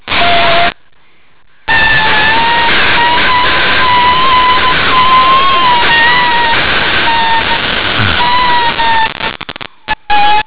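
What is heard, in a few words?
vocal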